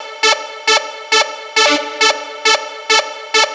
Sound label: Trance Synth